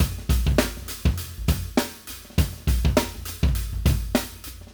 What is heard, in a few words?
Acoustic Live Drum; Platinum Audiolab